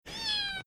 meow

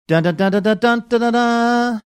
singing portion fanfare; 20th century studio

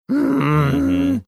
growling; like marge simpson

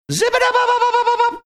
scatting

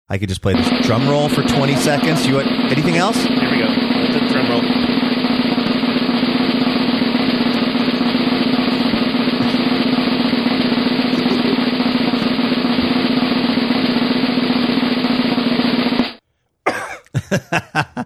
15 second drum roll cough

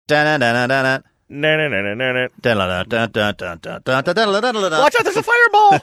singing underground theme; super mario underground theme; saying watch out

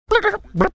making marsupial gurgle sound

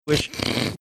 snorting

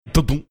imitating sound of running over person

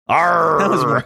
arrrrrrr

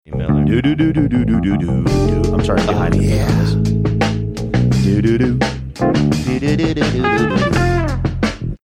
singing along with theme; barney miller

